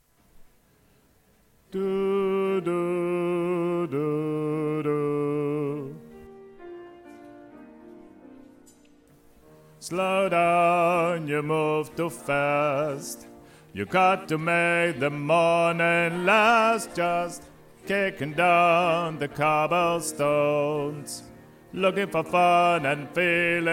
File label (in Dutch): sopraan